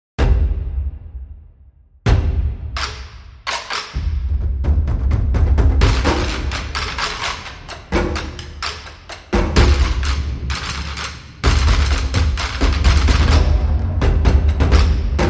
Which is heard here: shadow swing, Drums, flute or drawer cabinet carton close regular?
Drums